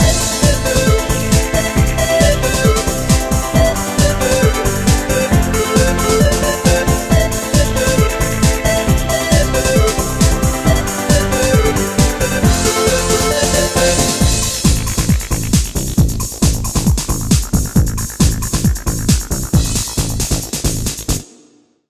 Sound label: ringtone dance, instrumental